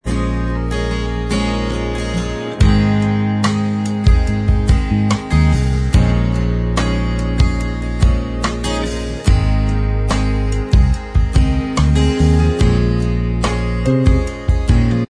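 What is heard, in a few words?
instrumental track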